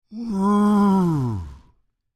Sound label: Creature, Human, Moan, Med; Groaning; Sound Effects:Voice; Big Room Sound